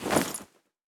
Inventory Open

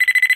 enemy Spider Alert Sound; Mars Sortie

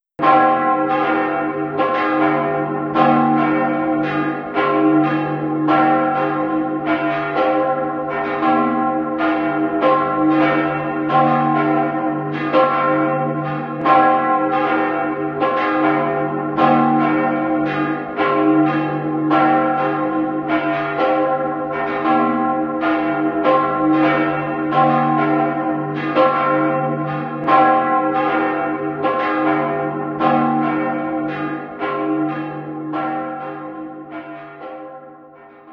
Goals Complete; Buildalot

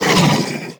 Monster Attack; Monster Lizzie

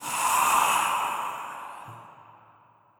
Vampire Run Scream

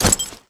Orc Clothes; Legend of Keepers (gamerip) (2019)

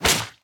Grain fail

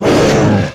Monster Attack Finisher; Monster George

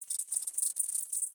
Env Void Grasshopper; viewfinder; Xbox Series X/S

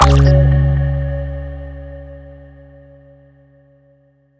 Cave Bounce

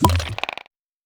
Checkpoint Re-Enter

